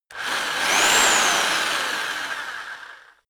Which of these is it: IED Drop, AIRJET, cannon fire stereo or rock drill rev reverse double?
rock drill rev reverse double